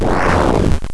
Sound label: Flamethrower